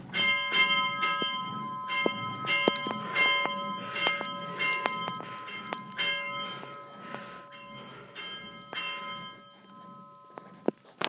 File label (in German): Klang Sterbegloecklein, Glocken